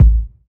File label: kick drum sample a key, Royality free steel kick drum sample tuned to the A note; kick drum samples